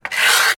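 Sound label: Wood Friction Scrapes; InMotionAudio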